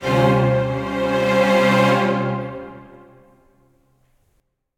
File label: Music Battle